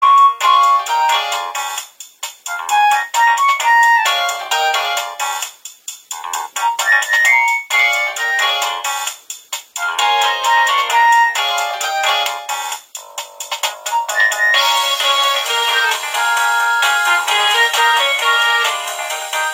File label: polyphonic ringtones